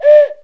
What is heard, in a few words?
DOVE, WHISTLE FX; Zero-G Ambient 1